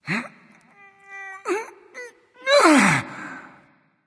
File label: gruntlift; smite